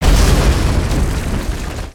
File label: strike